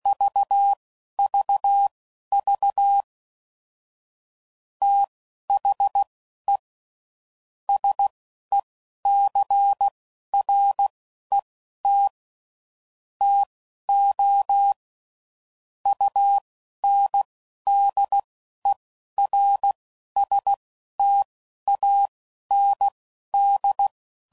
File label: CW Code Groups 10WPM; Morse Code; Technical Subjects